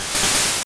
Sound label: Drill Peck; sfx; attack moves; pokemon; gen 1; rby